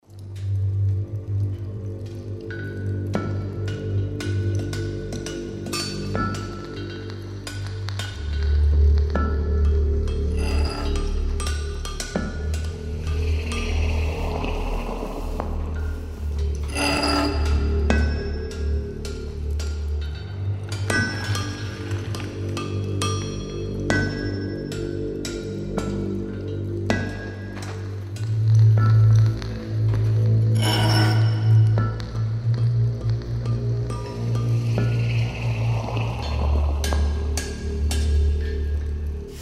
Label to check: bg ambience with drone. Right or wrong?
Right